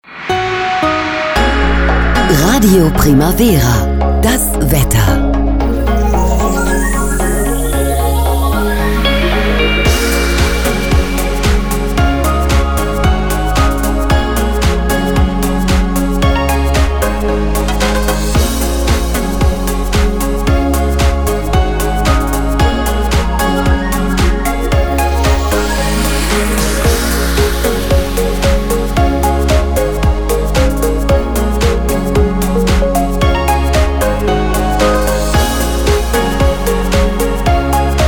Bumper und Musikbett Wetter; RADIO PRIMAVERA

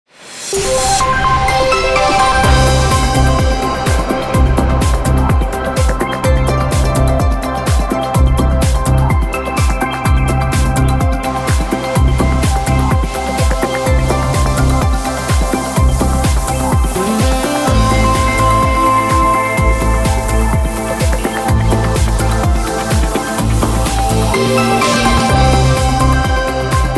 Wetter Bumper und Musikbett hot; RADIO 8

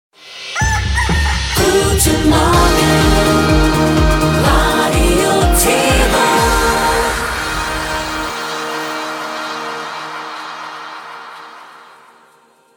Station ID Guten Morgen

